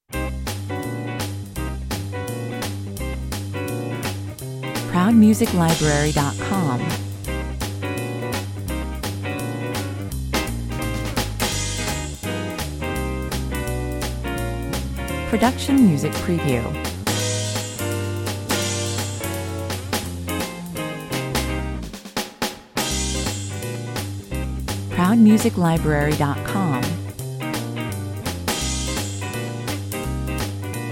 Swinging, small combo jazz. Electric guitar over bass & drums, 126 bpm; with a classic jazzy organ comp and a great jazz guitar solo